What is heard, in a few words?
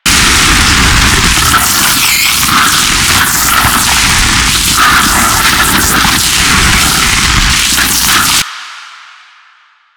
Light Damage Loop; Warframe; Eximus; JadeLight